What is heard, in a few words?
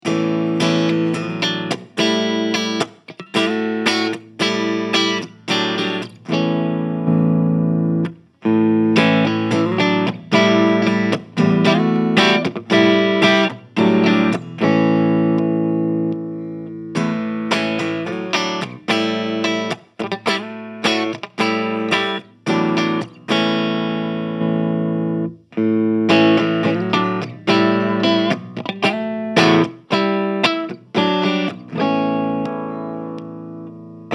Clean; Solar Guitars T1.7AD Aged Natural